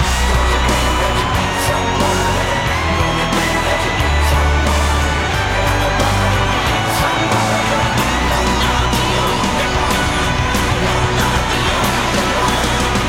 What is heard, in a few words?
big win loop